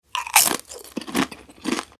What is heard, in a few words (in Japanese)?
スナック菓子を食べる音 その2